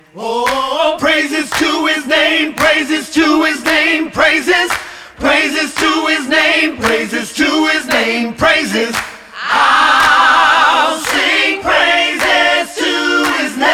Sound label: Praise Him Acapella